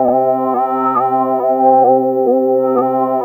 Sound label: WAVE TRIP, WAVEY DRONE; Spectrasonic Distorted Reality 1